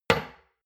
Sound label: shot glass place table hard, gastronomie; berufe; soundpool